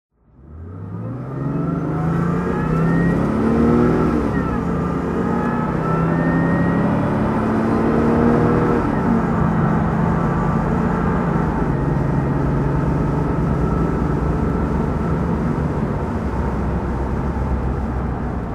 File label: aston martin db7 auto driving